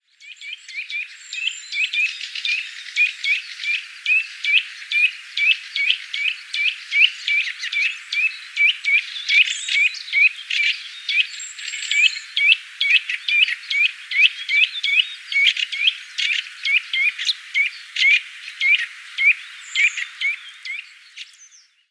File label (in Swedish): nötväcka